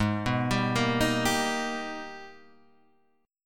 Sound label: G sharp Minor Major 9th, Guitar, Standard; arpeggiated; JGuitar